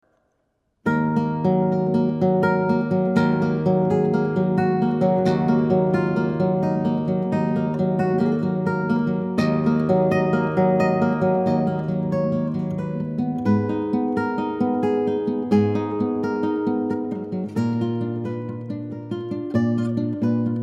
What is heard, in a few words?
Spanish Ballad